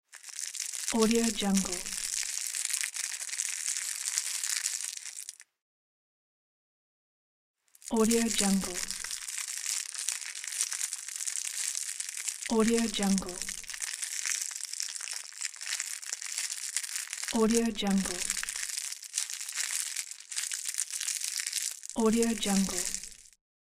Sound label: long rustle wrapper